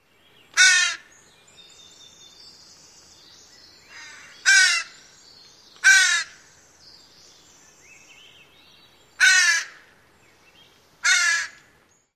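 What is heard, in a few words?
Northwestern Crow, calls number 2; WA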